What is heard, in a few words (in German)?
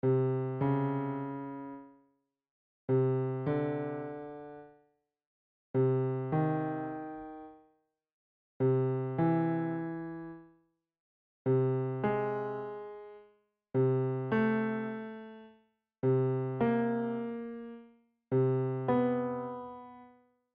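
große und kleine Intervall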